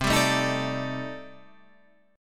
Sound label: C Minor 6th Add 9th C